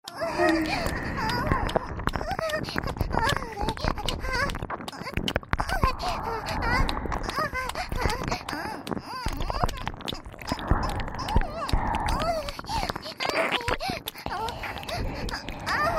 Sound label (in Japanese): ねっとり 両耳舐め ＋ 可愛い 喘ぎ声; 3WAYループ; オナサポ